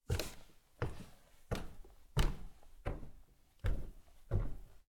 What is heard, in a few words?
Crawl Up; LOOPERS